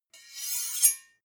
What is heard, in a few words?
two knives large scrape